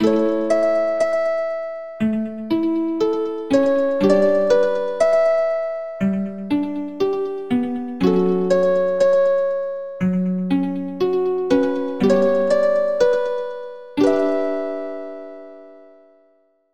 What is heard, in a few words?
musical; Undertale